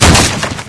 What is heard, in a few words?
wood plank break, physics